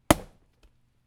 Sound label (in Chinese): 伐木; 场景音效